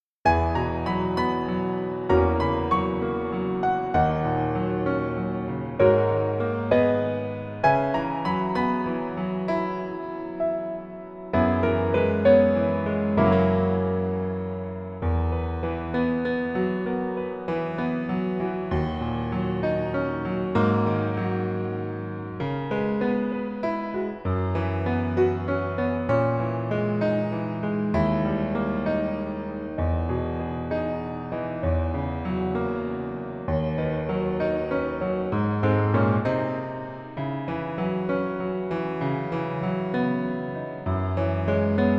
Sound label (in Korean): Piano ver 멜로디MR -1키